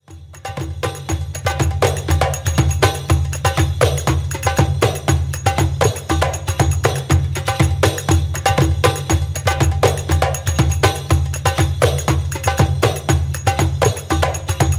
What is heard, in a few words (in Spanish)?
AIUB, crotalos; rapido; masterclass 3 secretos de crotalos